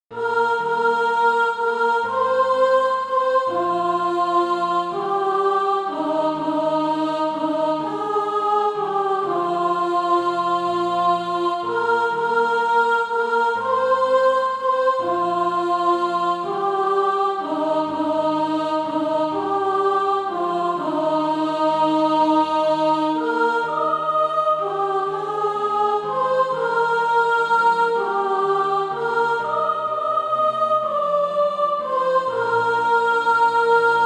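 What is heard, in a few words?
soprano, Practise Music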